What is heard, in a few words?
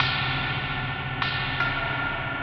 Gong Stick Hit; Sonic Foundry (Sony Creative Software) - Ambient Atmospheres & Rhythms; Ambient-Metals